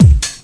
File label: oonce